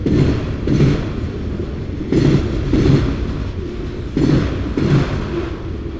train, chicago

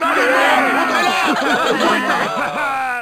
foul, Crowd

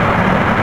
Nitro Lo, Vehicles

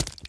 footstep run water

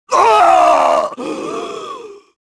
death; kyle

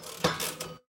pull arm, slots

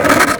revert conc, skater; Tony Hawk's Project 8; XBOX

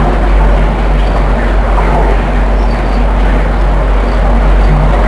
Mixer hum, bunker; Valkyr

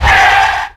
start; Decors

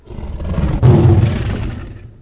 moan

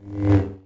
saber throw; weapons

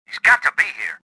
escaping; worker3

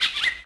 bird Call; theed; Star Wars Obi-Wan; XBOX

